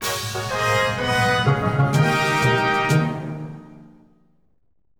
Jingle Get City; Eiyu Senki: The World Conquest

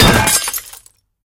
Metal Pipe Destroyed; Angry Birds

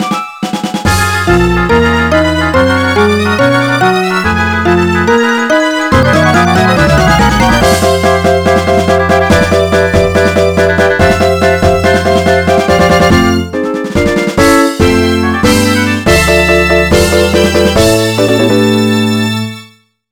BGM Tranceform; Irodzuki Tingle no Koi no Balloon Trip; NDS

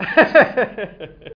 Voice Laugh; Scratch

